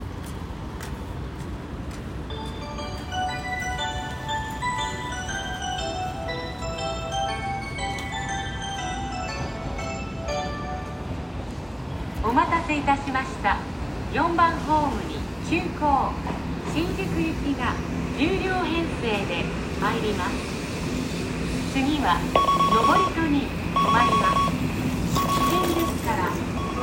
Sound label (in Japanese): 線向ヶ丘遊園東接近, train sounds; 小田急